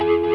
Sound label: WAH, Distortion Gtr; E-MU Producer Series Vol. 8 – Platinum Phatt